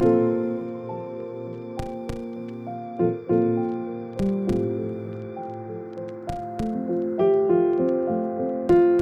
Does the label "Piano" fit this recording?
Yes